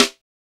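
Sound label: YIPPY snare; Drums In Your FACE!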